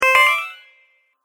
Magic Interface Sound; Magic Sound Effects

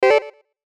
BLIP SYNTH; Beeps; Multimedia

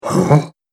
Orc Confused; horror

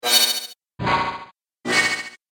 Laser Forcefield Hits

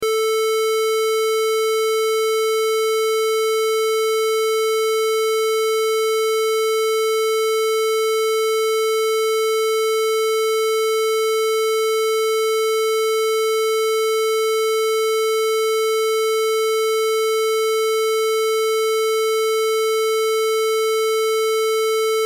Test Tone Square; Wave 440Hz 60 Secs -18Db Loop

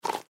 Panther Snort; Big Cats; Animals; Blastwave

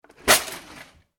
household drawer cutlery open; Cutlery Drawer Opening - Heavy Wooden Drawer Slides Open With Clunk Of Metal Cutlery; McKinney Sound

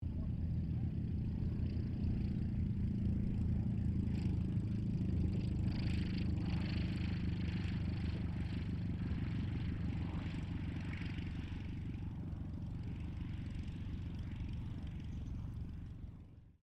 AIRCRAFT RYAN STM-S2 LAND; 1939; Airplanes; Vehicles

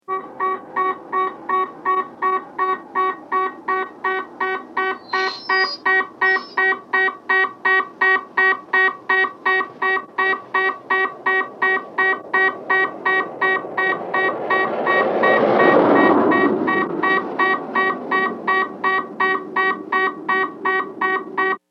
Police Radar Beep Car Approach; Emergency Vehicle; Emergency Vehicles

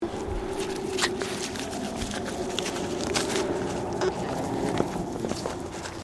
Animal Alpaca Female Eating; Alpacas on a farm in Gippsland Victoria; Miscellaneous Animal

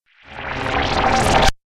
Abrupt Flubber Whoosh; Whoosh Sound Effects

